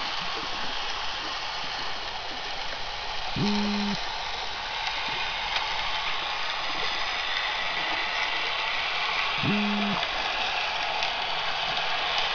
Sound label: toadfish and boat sounds; soniferous